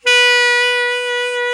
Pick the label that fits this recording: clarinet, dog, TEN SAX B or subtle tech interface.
TEN SAX B